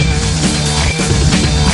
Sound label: loop